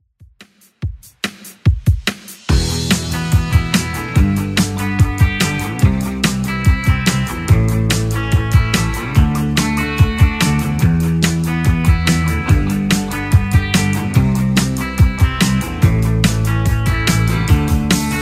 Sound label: 70s Rock 144bpm